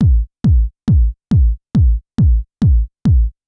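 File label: bass drum, Techno 138 BPM; Best Service ProSamples vol.54; AKAI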